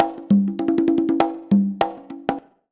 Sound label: CONGA, Modern Percussion Loops